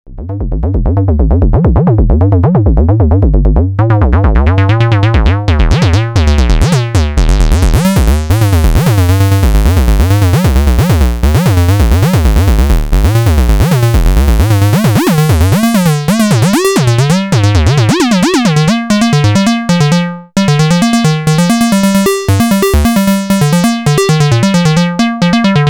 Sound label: Random Acid